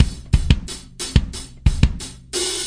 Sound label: 090 bpm gated big hat, drum loops and breaks